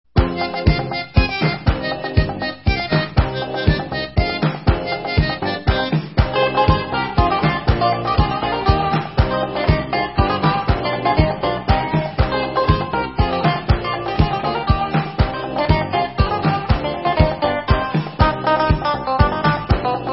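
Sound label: Instrumental, Israeli Dances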